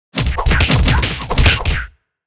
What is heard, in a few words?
Flying combat anime fight